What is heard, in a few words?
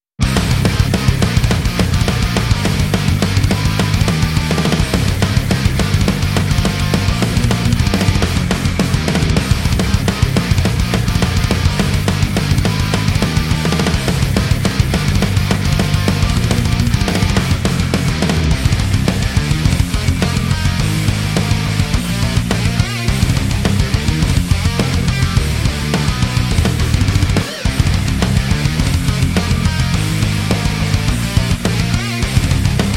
buzzer bass; polarity audio